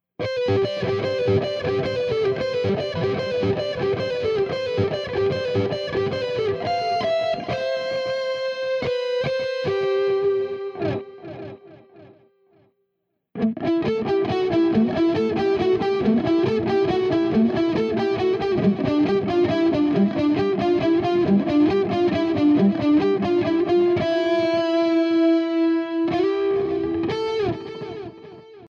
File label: ENGL melodic leads